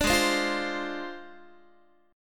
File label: G sharp 6th Flat 5th G sharp Guitar; G#6b5; strummed